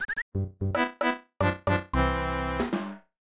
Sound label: Player Down; SMAS; SMB3